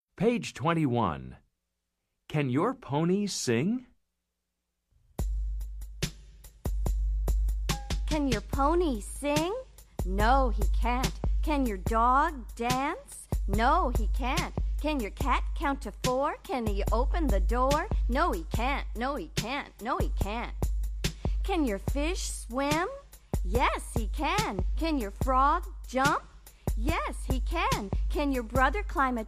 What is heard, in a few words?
jazz chant can your pony sing